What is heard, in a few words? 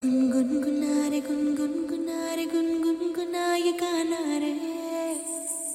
Message